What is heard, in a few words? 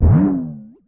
Timpani; musicradar; cartoon caper